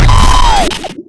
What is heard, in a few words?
DROWN